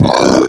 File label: sleep hit